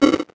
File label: Multimedia Internet CD-Rom Flash Hi-Tech